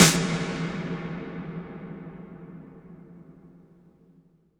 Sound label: IMP SNR DRUM, Drum, Cinematic Impact